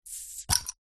food drink beer bottle open cap with manual opener; zapsplat